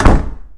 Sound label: doors door close